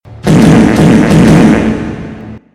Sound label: fart; terminator